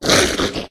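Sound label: lizard wound; yasher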